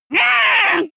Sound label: witch scream angry